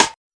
classic hh Rim, Classic Hip Hop; STYLE KITS (Hip Hop, Etc); Classic Hip Hop Mega Kit

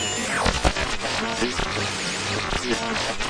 Radio Noise, radio tuning noises

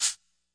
classic hh Shk6, Classic Hip Hop; STYLE KITS (Hip Hop, Etc); Classic Hip Hop Mega Kit

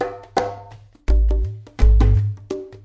conga and wavedrum, 084 bpm, drum loop

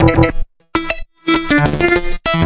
194 offkilter synth and synth bass

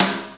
SD Brush kit, Electronic Jazz Kit; STYLE KITS (Hip Hop, Etc)

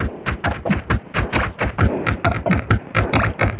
swingy droplets; LOOPING AMBIENCE

